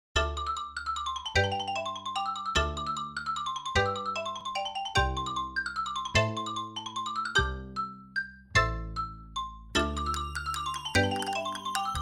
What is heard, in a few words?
Xylo Tappit